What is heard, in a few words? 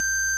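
ORG Vox G, Continental organ; Keyboards of The 60's and 70's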